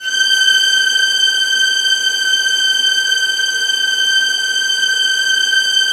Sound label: VIOLINS, Strings; ILIO; Synclavier Strings